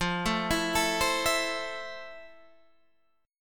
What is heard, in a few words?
F Major 7th F, Guitar, DADGAD